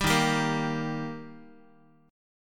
Eb Major Eb Guitar; strummed; JGuitar

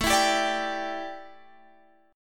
A 7th Suspended 4th A Guitar Drop D, chord

